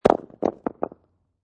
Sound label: Rock Large Drop On Cement, Impact, Rock, Large, Dropped On Cement; Rocks; Materials